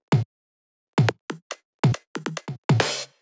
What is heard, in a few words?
drum Loop 140BPM Perc; Vengeance - Essential Clubsounds Vol.5; VEC5 Drumloops; VEC5 Multi Loops 140BPM